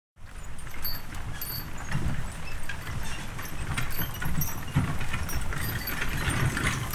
Horse Drawn Wagon; Electa Quinney

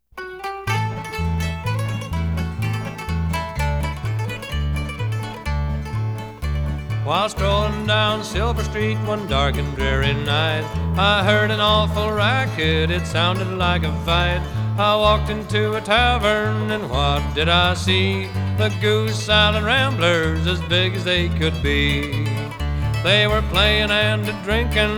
Hurley Hop

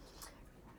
Birds; University Place; Why Birds Sing; RX 9 De-click

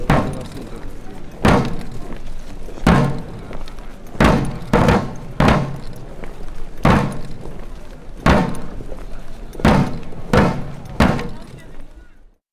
Infantry March Drum; Electa Quinney

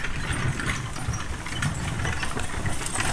Horse Drawn Wagon; Electa Quinney

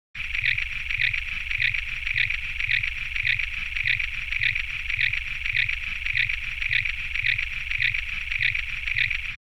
Pulsing Loop, Spinning, Rhythmic, Marbles Clanks; Sound Design

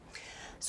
Birds

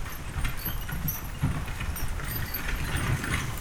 Horse Drawn Wagon By; Electa Quinney

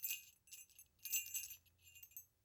Bells, Small, Jingle, Soft, Fast; Electa Quinney

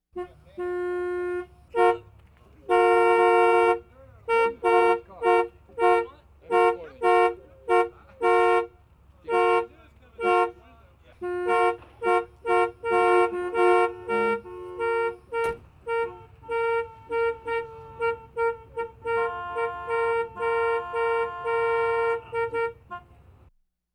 Cars Ford Escort Traffic Jam Horn Honks

